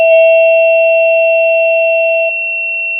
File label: pop, Sound of a church bell